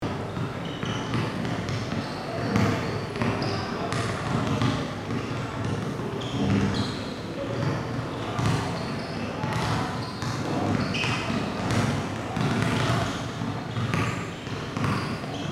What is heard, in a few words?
Gymnasium ambience students playing basketball loop